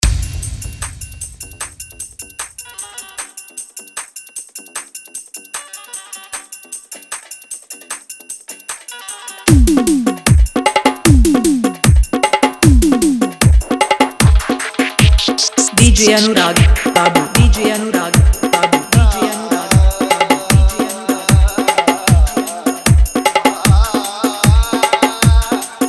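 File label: Old Wedding Mix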